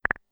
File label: flash Sound; VR-GAME